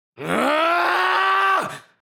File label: Attack shout anime villain vocalization; gfxsounds